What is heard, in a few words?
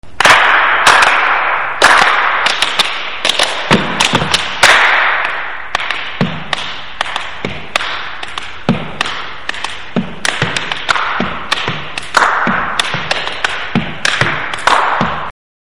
clap; finger clicks and tapping the palm with two fingers; PERCUSSION ENSEMBLES; Four Players